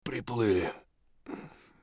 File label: bear low hp; eft